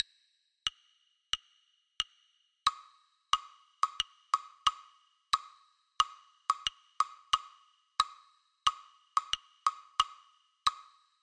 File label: Funk Groove 90